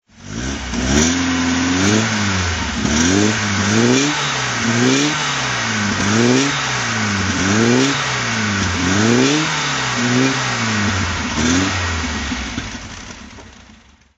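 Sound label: alfa romeo gtj 1600 throttle, engine sound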